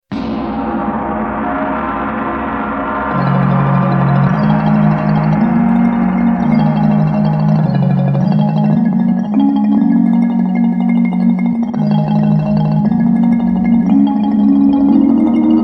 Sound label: Gongs Marimba